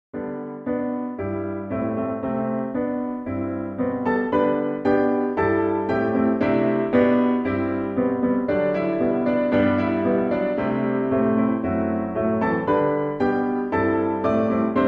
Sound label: National anthem (Instrumental); Sancratosia